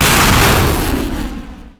bal launch

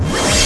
laser start; laser gatling

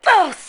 urgh

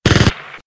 machine gun

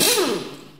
wrench

